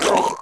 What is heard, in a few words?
gurp; player